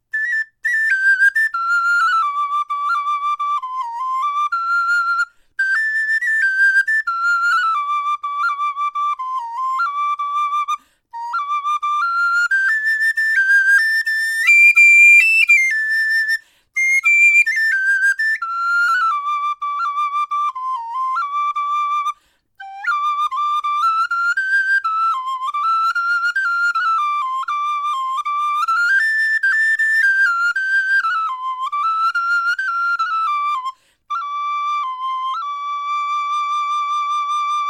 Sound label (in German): blockfloeten sopranino melodie; schneider